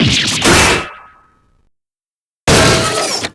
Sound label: surprised full